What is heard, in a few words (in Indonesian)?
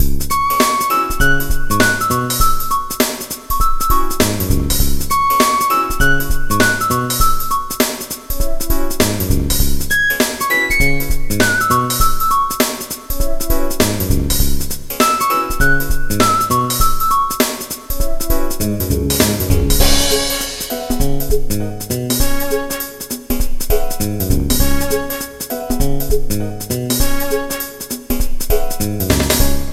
Nada Dering Nokia Jadul; Easy Street